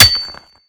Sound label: pickaxe use